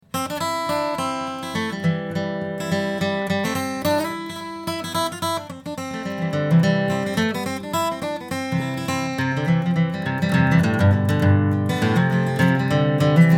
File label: flatpick